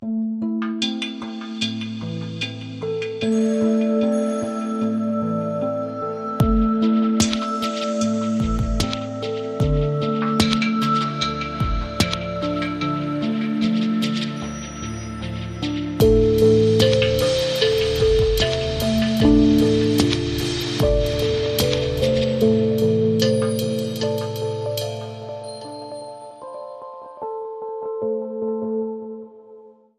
Big Chimes

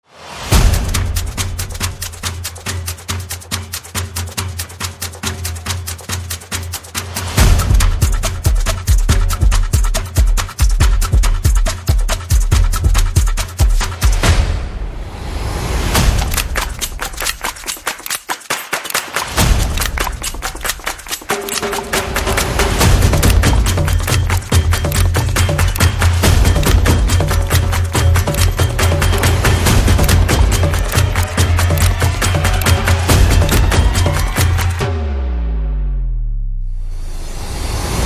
Snap Clap, No Whistle; Snap Clap Out

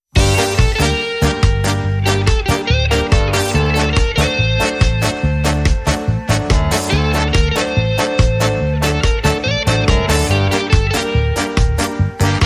Southern Beat

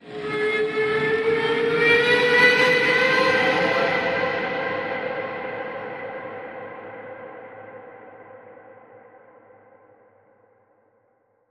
Astral Distant Bend

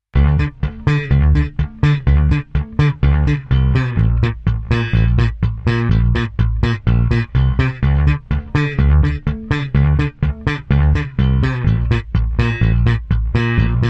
Dance Night Bass

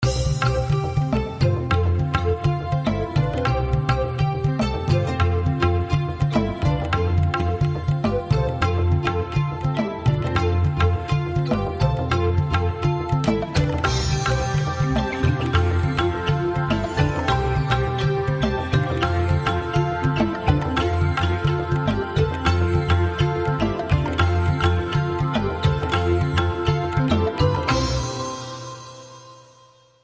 Tabla Dance

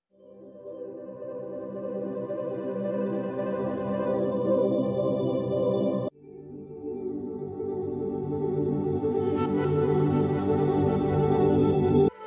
Ambient Mix

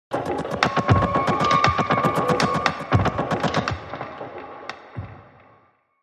Sting

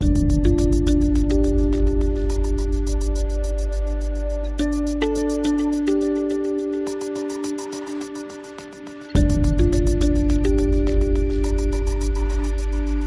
No Choir and No Orchestra

